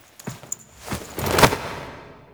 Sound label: Foley B; Warframe; Quests; The New War; Zariman Void Kid To Drifter Reveal